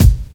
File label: KIT, Dance Mega Drums, HIP; Best Service